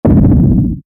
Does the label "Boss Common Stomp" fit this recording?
Yes